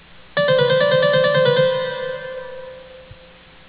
Idem (the same; Palmer: "descending trill with termination")